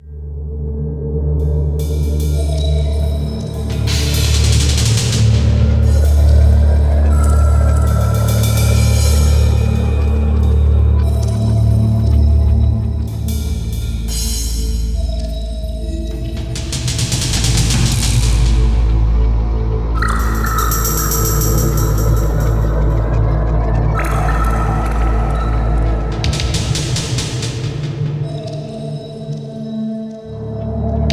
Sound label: Underwater Ambience; Donkey Kong Jungle Beat; Pristine Sea